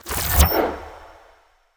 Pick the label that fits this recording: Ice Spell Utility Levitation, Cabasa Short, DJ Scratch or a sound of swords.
Ice Spell Utility Levitation